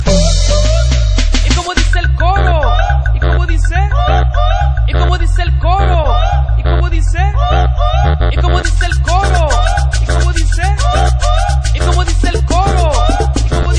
trival costeno, ringtones latino

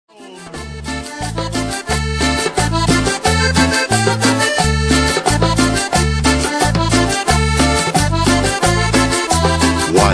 cumbia acordeon

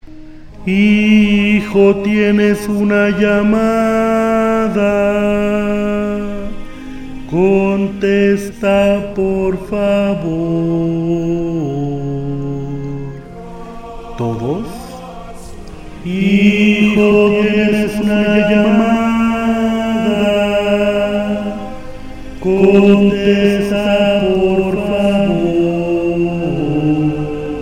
Risa

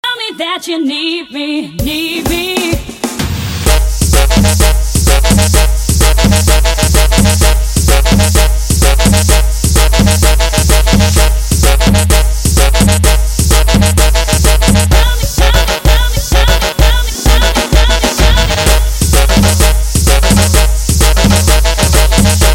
Saxofon

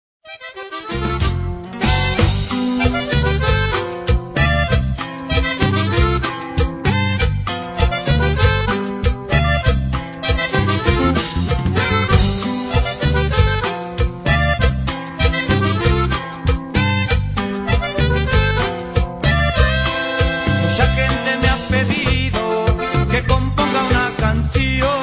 cumbia

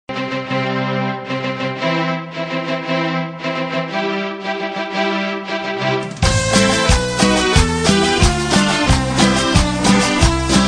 cumbia boda; latino

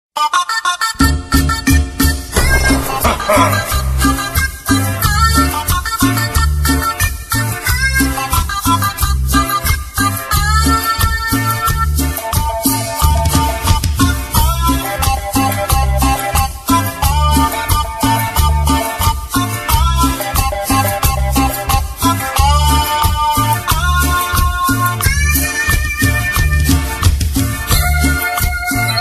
cumbia

